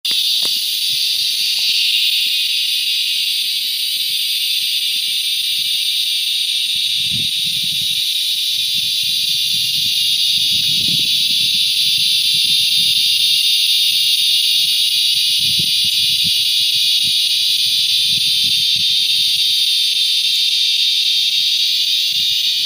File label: Florida Cicada